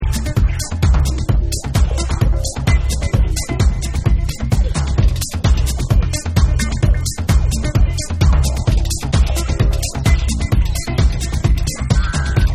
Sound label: SAMBA ROCK